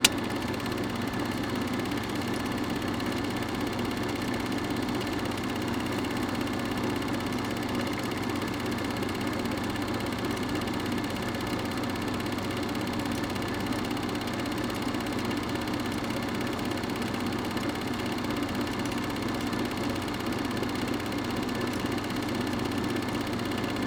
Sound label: antique movie projector hum; mixkit